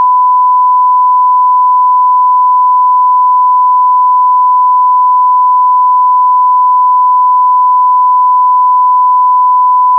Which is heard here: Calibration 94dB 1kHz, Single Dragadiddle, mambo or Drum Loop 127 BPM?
Calibration 94dB 1kHz